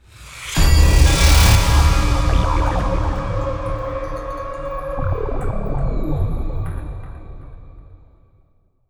Round End; Zombie Stalingrad

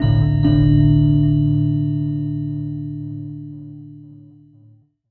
Game Over Dark Bell Chime; the legend of gwen

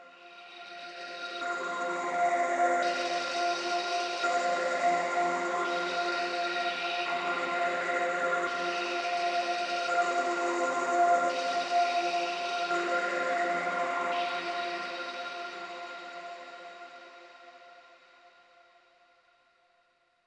Flytrap Overlay Drone; gamerip

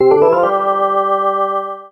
Unlocked; raving rabbids travel in time wii gamerip 2010

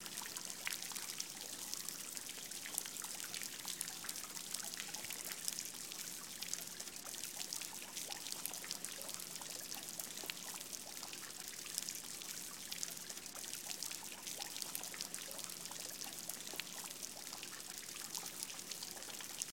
Gentle Fountain Loop

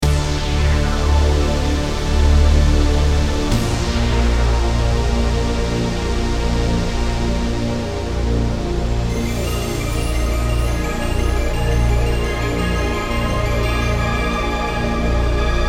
Intro PSX Music; BullShot

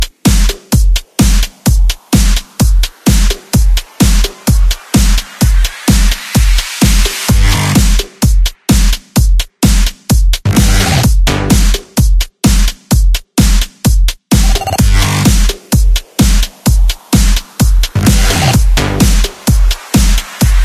Cutscene Music Electro; the monk and the warrior the heart of the king

